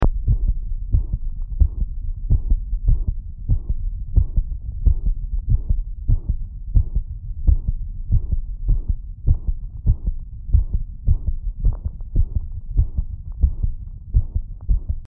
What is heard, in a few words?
Grade 2, Heartsound, Grading; Heartsound Library